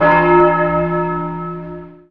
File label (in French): Cloche